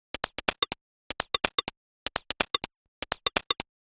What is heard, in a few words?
Top 125bpm, House Drumloops; 90 s Sample CDs; Zero-G House Fabrik Drumloops; ZG Drumloops